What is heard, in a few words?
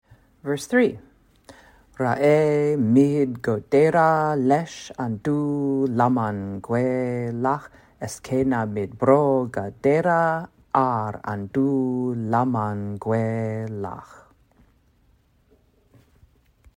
Chant